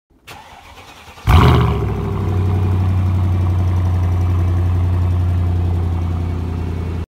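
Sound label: Benz cls500, exhaust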